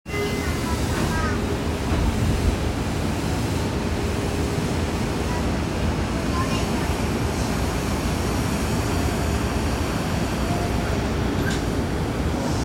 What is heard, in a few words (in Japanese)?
池袋線武蔵藤沢西, train sounds; 西武